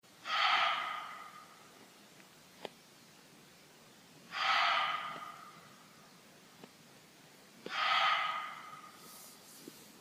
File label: stag